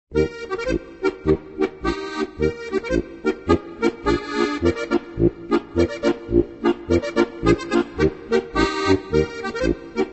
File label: polka